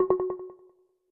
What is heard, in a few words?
calls alert; Slack; macos